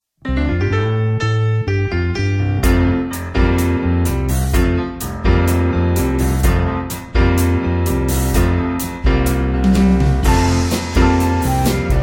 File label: Instrumental; Go Tell It On the Mountain